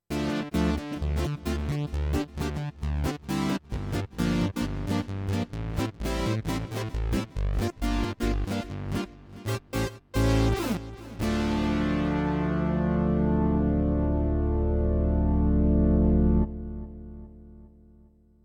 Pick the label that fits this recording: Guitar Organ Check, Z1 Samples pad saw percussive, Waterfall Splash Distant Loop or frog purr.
Z1 Samples pad saw percussive